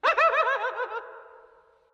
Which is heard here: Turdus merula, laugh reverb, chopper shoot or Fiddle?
laugh reverb